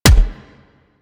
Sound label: Weapon Punch Rifle